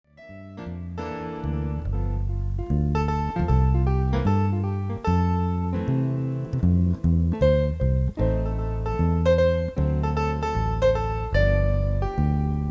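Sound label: PNO, piano; duo cut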